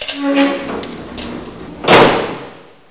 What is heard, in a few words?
DOOR; CHASM